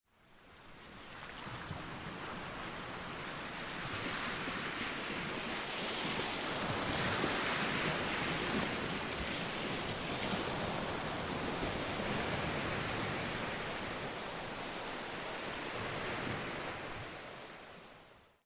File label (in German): Regenwald Strandtraeume, Madagaskar